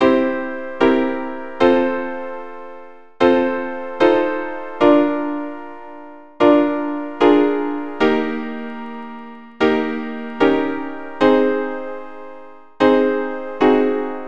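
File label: Circle of 5ths, keyboard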